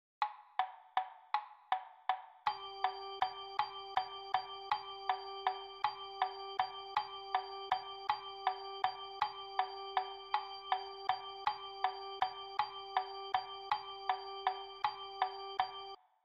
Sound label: Rhythm Practice G note